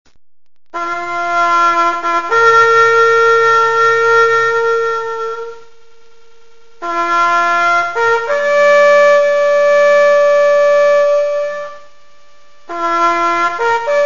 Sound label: Bugle Call Taps; armi